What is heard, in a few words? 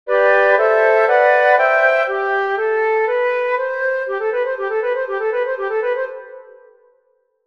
Legato Test Flute